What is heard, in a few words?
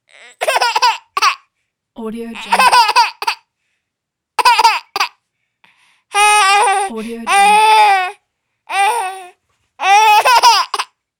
baby laugh; human sounds